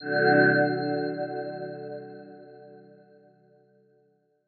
光魔法 小; 魔法効果音

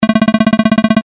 会話

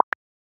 メッセージ

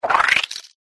ぐちゅう つぶれる音

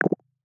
ポコ コミカル

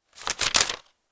札の音; ボードゲームやカジノ、麻雀の効果音です。

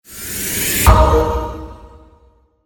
ガチャ インパクト タメ有り モノラル

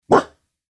犬の鳴き声

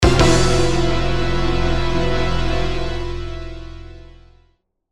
デデーン; 연출 효과음

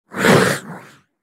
モンスターの鳴き声

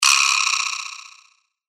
カラカラ ギロの音

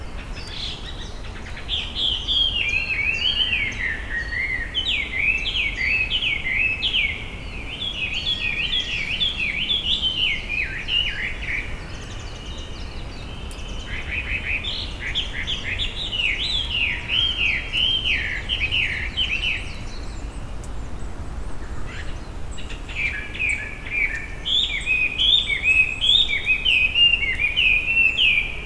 鳥; 環境音

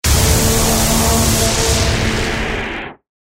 闇魔法 大; 魔法効果音; RPGなどに使える効果音です

